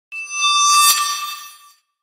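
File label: tension with violin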